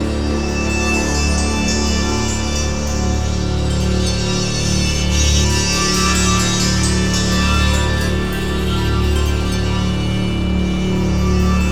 DULCIM RUN R

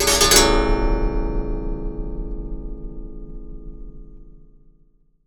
Raw, Wire & Tin, contact mic; musicradar; contact mic madness

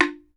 BAMBOO, World Assortment

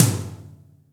ROCK TOM; Big Fish Audio; Synth City

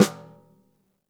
snare snares on; JazzFunkKit

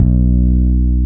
BS FLS BS, E. Bass, Warm Fretls; Roland - Rhythm Section

